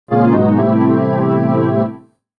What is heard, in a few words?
DEEPHOUSE SYNTH 125 A; Keys